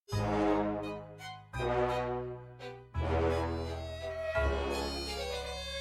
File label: violin